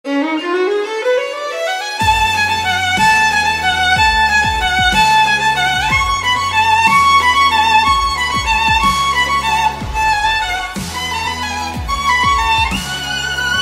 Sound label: violin classical music; violinist